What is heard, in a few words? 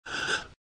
Mask Cry; Lethal Company